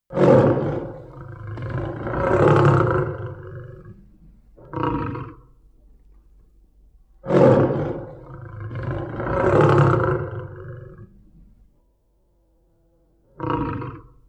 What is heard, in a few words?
lion s roar; sound effects factory